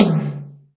Tom, Alesis DM5 drums

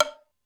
Hollow, Alesis D4, drums

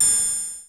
perc, Roland MC-909

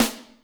Snr Brassy, Alesis D4; drums

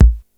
kick, Yamaha RY-8

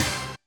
hit, Roland MC-909

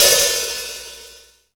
Hat, Alesis DM5; drums

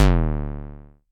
bass stabs; FutureRetro FR777

